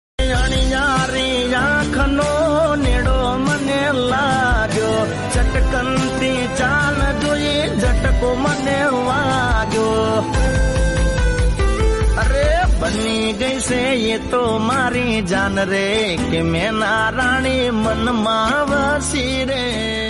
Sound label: gujarati song